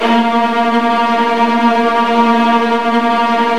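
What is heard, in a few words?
viola tremolo A#1 right, Virtuoso Strings; ILIO; Kirk Hunter Virtuoso Strings VOL-2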